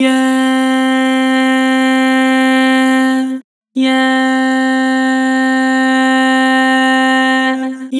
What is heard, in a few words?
"Yeah", Vocals; Harmor